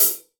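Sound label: open hi-hat, Real Drum Kit, ROCK KIT; Best Service ProSamples vol.43 - Real Drum Kits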